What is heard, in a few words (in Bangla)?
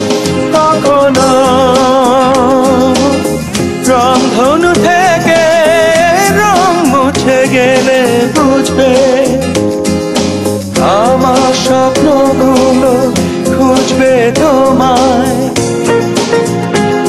Bangla Hit Song